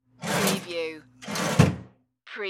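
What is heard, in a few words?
Cash Register Open Close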